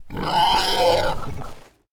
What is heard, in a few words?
attack; Rust